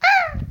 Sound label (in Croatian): crvić umire; umiranja